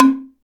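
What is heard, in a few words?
percussion African log, log drums; Roland LCDP11 Africa VOL-1; Wood & Metal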